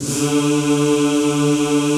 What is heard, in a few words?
ZZZ D2, Classical Choir; Best Service; Extended Classical Choir